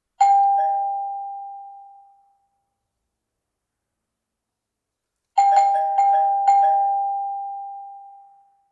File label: various doorbell sound effects